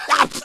pain; zombie plague; gc2 smoker

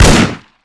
ppd; cccp

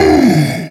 Nemesis Pain; zombie plague

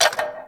tic; cstrike

